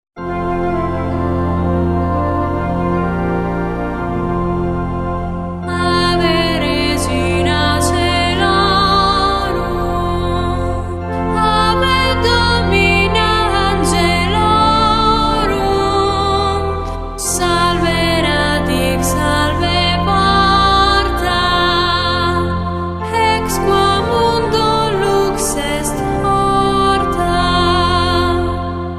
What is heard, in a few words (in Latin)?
Ave Regina Caelorum Canto Gregoriano